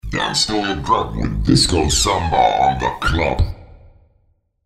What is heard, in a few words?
samba